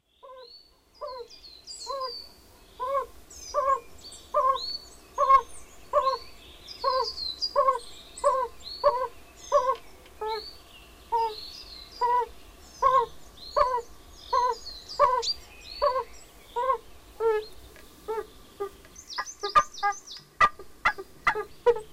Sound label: Bombina variegata